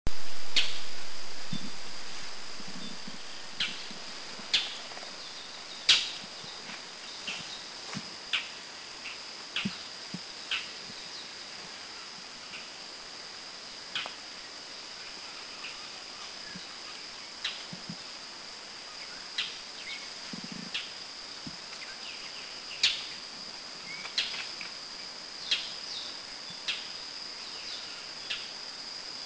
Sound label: Mountain Shrike Kitanglad